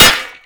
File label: impact metal; weapons; Modern Warfare